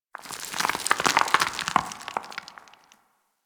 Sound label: Outpost Rock Debris; Warframe; Ambience; Corpus; Outpost Defense Two